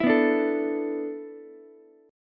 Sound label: A Suspended 2nd Suspended 4th, down, Ukulele; Asus2sus4; strummed; JGuitar